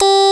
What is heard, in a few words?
VOIC WV NT2 G1; Big Fish Audio; Synth City